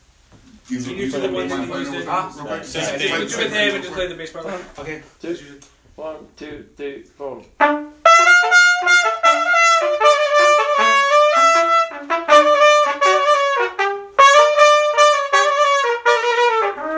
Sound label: Bass End Section